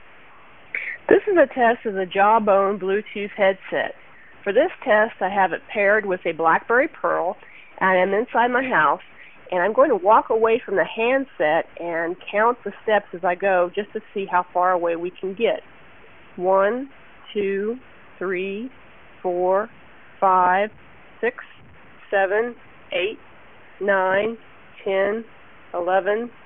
inside; jawbone

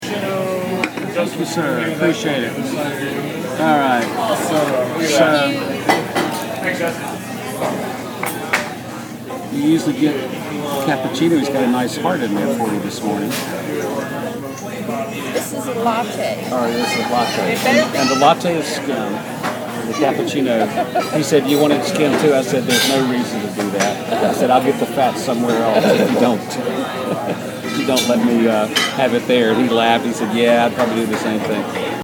Built in new iPad recording

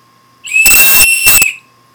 atwood whistle

